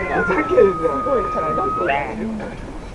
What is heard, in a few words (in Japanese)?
uguisu